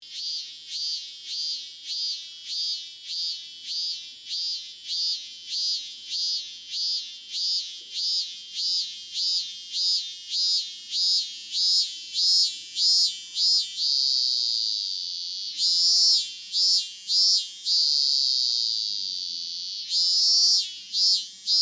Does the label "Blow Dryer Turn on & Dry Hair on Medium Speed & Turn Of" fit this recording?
No